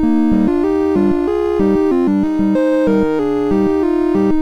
MOS6581R3, sid; Raddish-Two

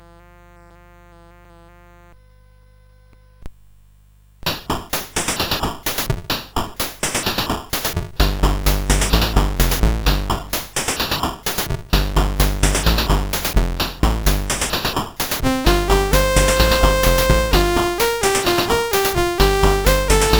Improvisation, CSG8580R5

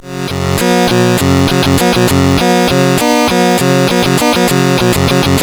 sid CSG8580R5; Dungeons of Doom

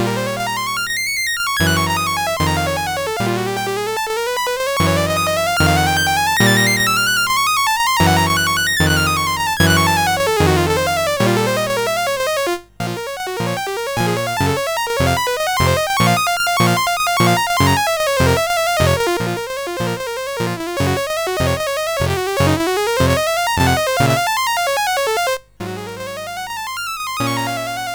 jazz etude, CSG8580; CSG8580R5

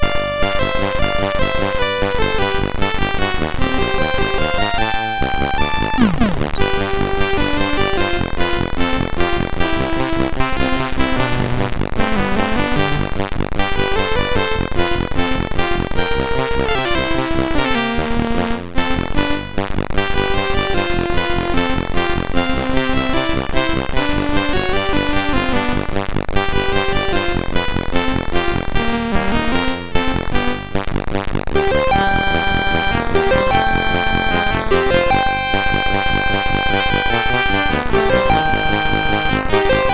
sid, MOS6581R3; Double Dribble

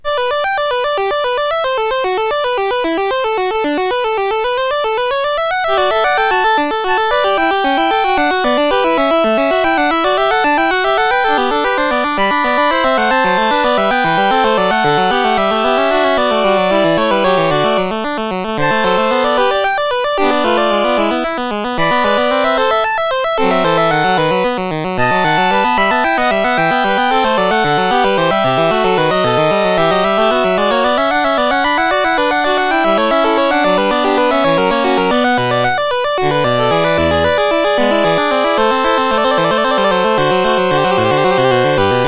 Fugue in G Major, MOS6581R2

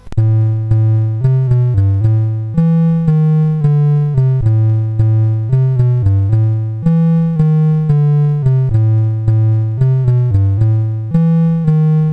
sid CSG8580R5; Nibbler

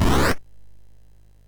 sid MOS6581R2; California Games

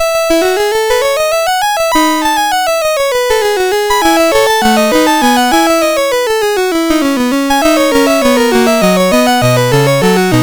courante, MOS6581R4

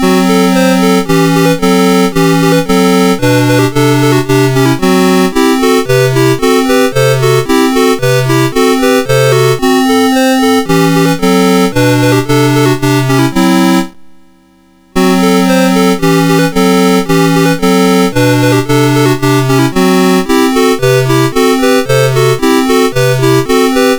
Gently Sleep, MOS6581R2

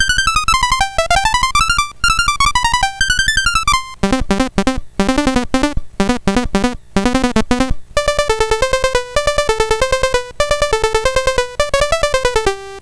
Mexican Hat Dance, MOS6581R3; BASIC

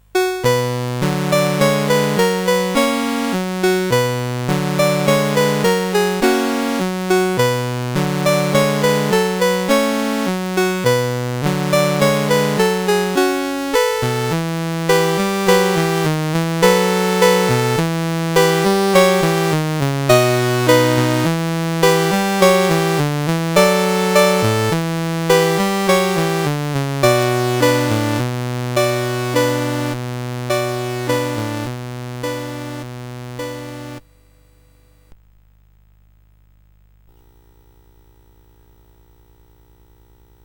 Waltz, CSG8580R5; David W Tattam

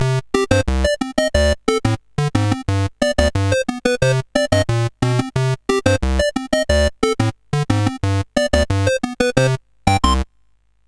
sid, CSG8580R5; Coco-Notes

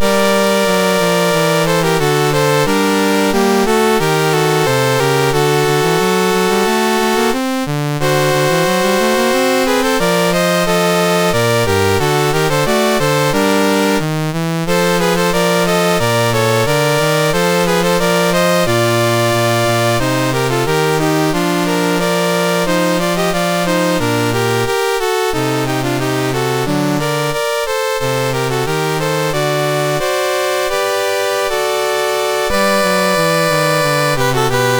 MOS6581R3, National Anthems; Puerto Rico

